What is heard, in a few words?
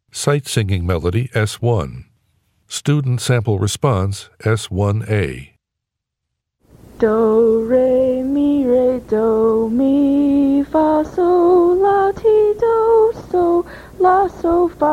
music sample; Sight-Singing; Sight-Singing Sample 1A